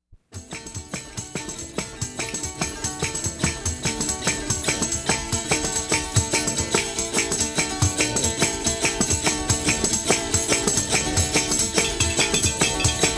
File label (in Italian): Tarantella; calabria; Tarantella con Chitarra